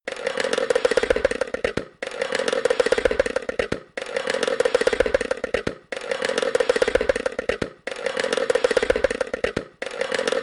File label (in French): Casque contre barriere